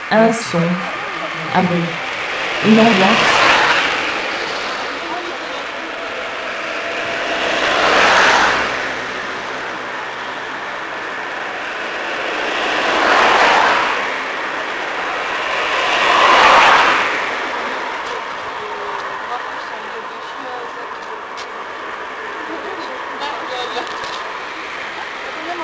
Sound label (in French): Un son un bruit une ambiance; Les oreilles du monde